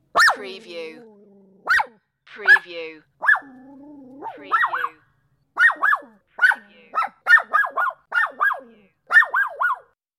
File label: Little Dog Barking